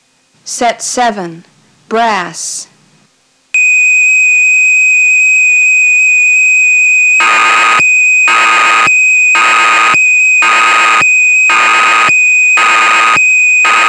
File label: Brass; dx9